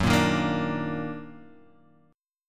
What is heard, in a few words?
D sharp Minor Major 7th F sharp, chord